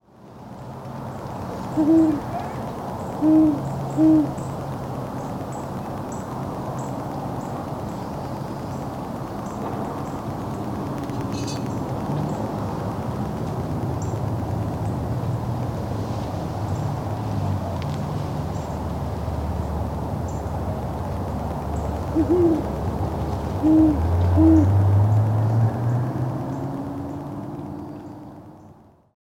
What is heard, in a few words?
Great Horned Owl Bubo virginianus pallescens; Arizona